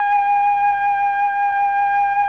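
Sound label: Mellotron flute 16G#4; Sonic Reality; Vintage Timetraveler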